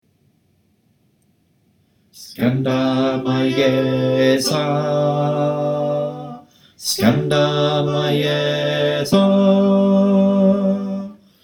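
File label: bass, choir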